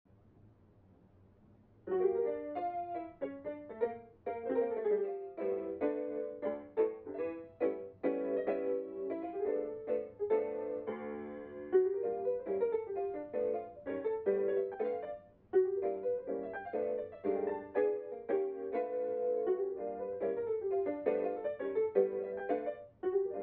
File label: vinyl keys; stereo7 audio